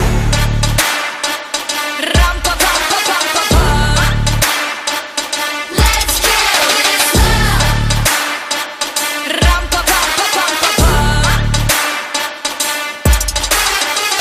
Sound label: Musicc